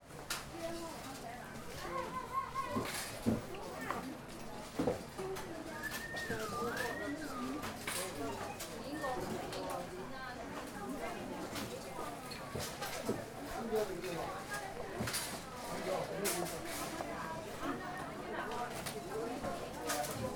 Crowd,Chinatown,Supermarket,Checkout,Voices,Mandarin,Lively - some shopping cart moves